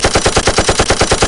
Machine Gun

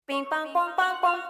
Bing Bang Bong

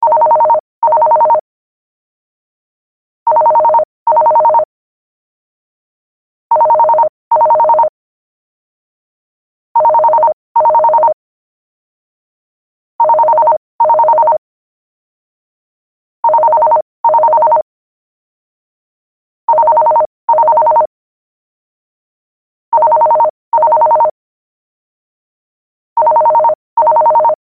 Landline Phone Ring

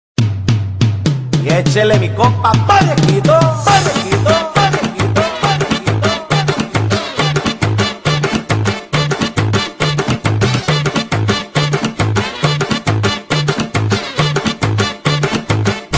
Tribal

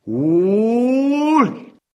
Ooooooh; 707

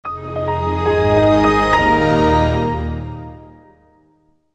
Xp Startup

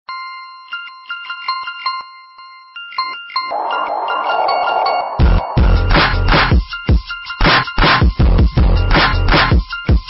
Double Bass

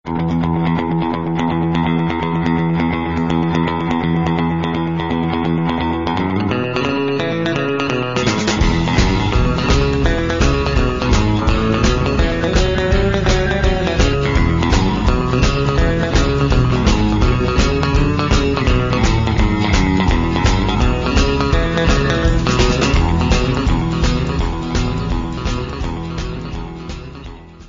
Guitar Boogie

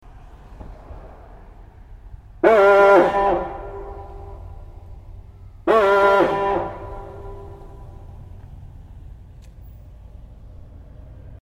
Fire Horn

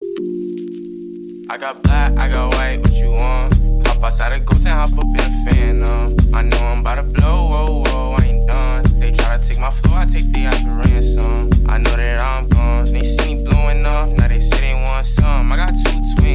lofi remix

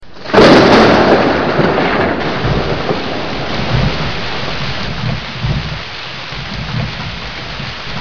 Rain

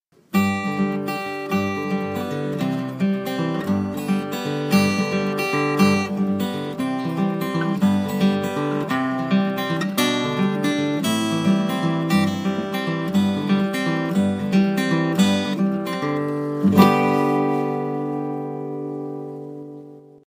Guitar Ringtone

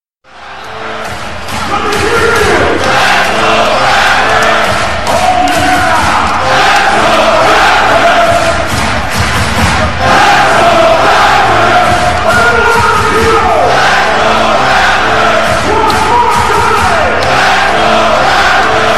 Chant; Raptors

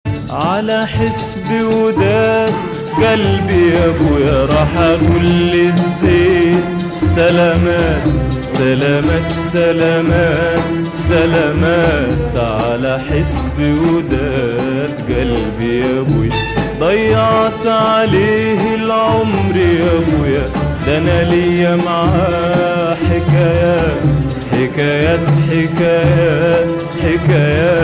Classical Arabic

